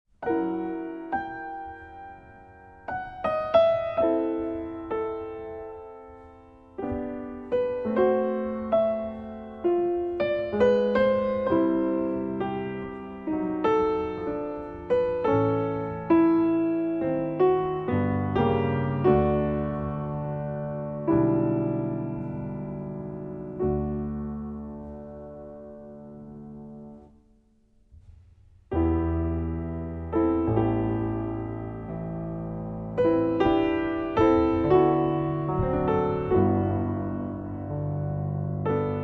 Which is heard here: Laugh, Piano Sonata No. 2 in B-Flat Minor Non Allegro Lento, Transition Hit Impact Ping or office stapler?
Piano Sonata No. 2 in B-Flat Minor Non Allegro Lento